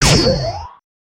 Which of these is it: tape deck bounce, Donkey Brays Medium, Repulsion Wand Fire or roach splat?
Repulsion Wand Fire